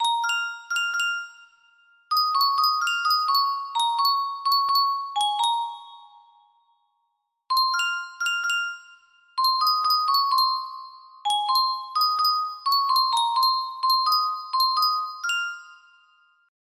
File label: yunsheng custom tune music box, music box melody